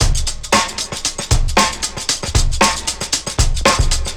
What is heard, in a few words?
Break Funk Chop 115 bpm, drums; Loops; Ableton Live 12 Lite; Core Library